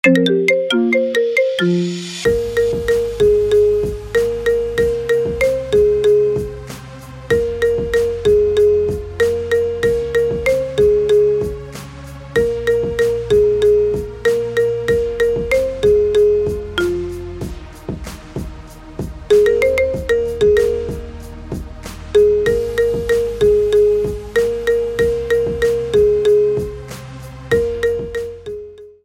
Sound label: Marimba